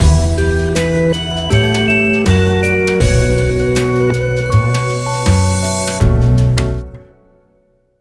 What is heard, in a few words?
Sys Gameover; melty blood type lumina 2021 pc